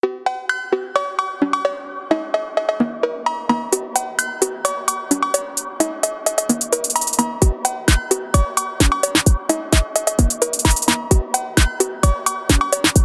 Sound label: Phonk